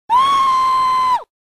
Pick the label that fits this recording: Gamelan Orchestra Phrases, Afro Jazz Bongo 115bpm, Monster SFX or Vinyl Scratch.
Monster SFX